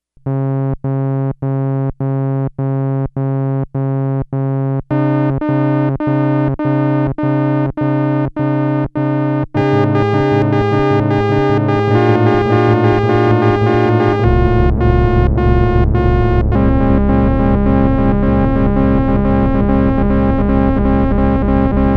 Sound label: Random Arpeggiator